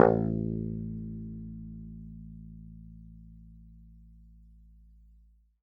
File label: a2 fff, swagbass